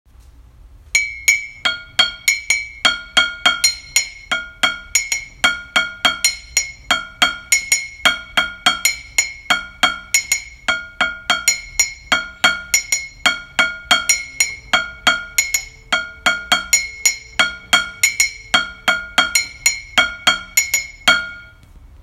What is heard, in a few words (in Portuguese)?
percussao xicaras